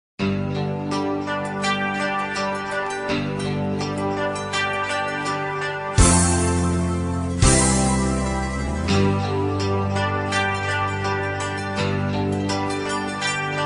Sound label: Spanish Flute, Instrumental